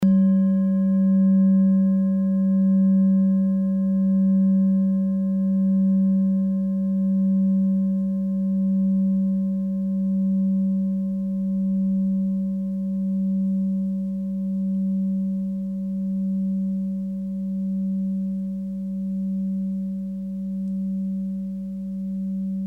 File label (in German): klangschale ladakh